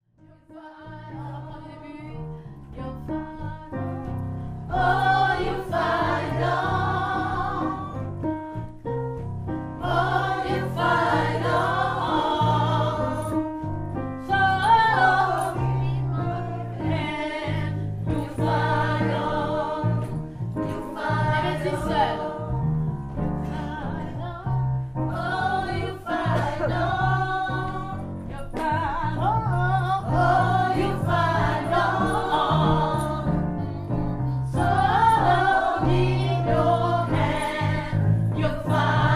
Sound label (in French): mezzo; refrain; gospel